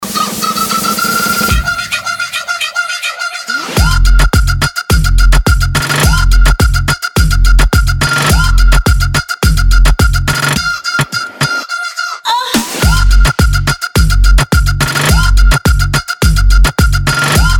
electro house trap

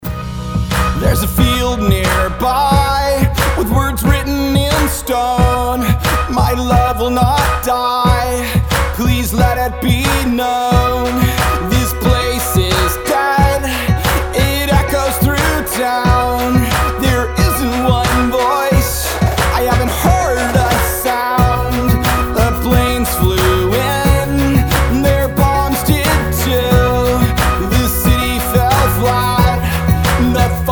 acoustic

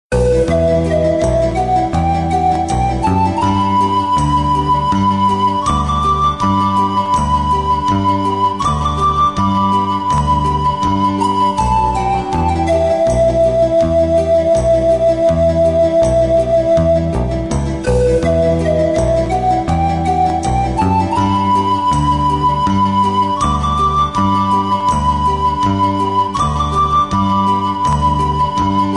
pipes of pan prikolnaya sms melodiya

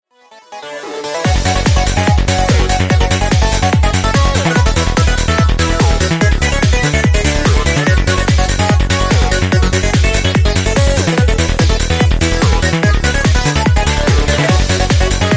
dance floor microdelic mix

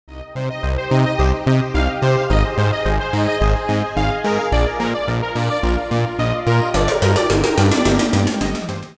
disco mix

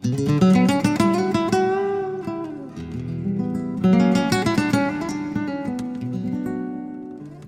sms ibiza guitar sms v zhanre flamenko

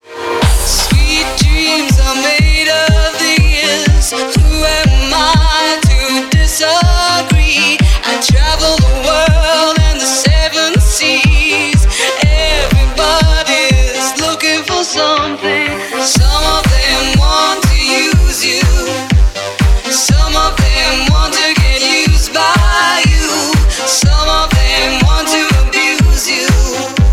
nu disco mix

